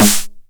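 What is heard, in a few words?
909 SD Tape, snare drum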